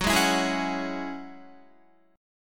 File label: Gb 7th Suspended 2nd, Guitar, Gb7sus2 chord; strummed; JGuitar